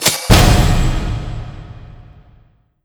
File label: xp level up; cstrike